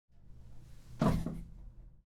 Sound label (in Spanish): Sentandose Silla De Madera